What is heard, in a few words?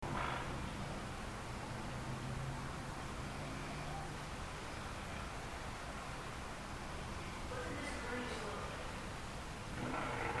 Coin Rolling; Public Locations